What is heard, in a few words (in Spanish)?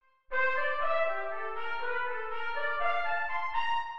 Dominante en C